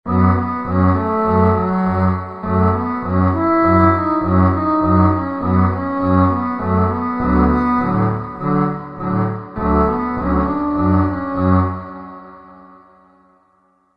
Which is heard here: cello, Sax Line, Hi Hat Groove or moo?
cello